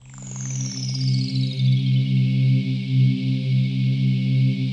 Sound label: PAD, Dance Mega Synth